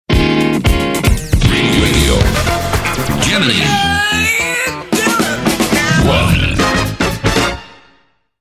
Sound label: Jingle; Radio Gemini One